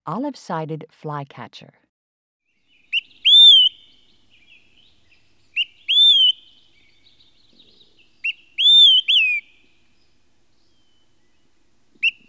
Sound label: Olive Sided Flycatcher